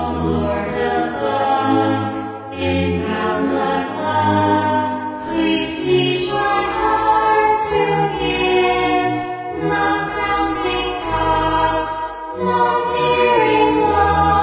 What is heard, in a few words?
choir, great amen; synthv lite demo